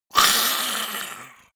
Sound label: monster throaty assault; kreaturen